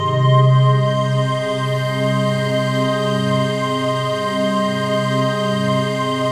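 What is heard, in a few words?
trance pad; Best Service; XX Large - Pads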